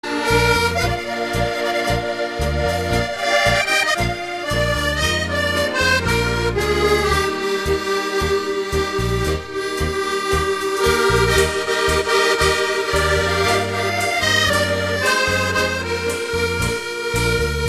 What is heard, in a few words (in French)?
Accordeon